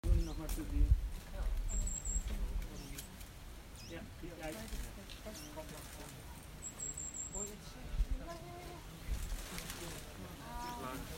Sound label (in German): goldhaehnchen-laubsaenger